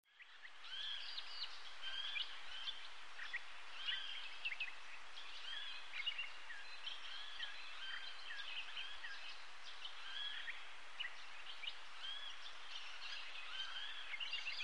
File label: Light Wind In Trees With Busy Birds; rural